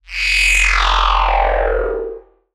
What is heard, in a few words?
Surge; martyn stonehouse